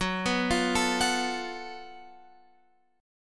F sharp Minor Major 7th Double Flat 5th, Guitar, Open F; arpeggiated